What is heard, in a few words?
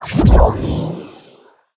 charge cast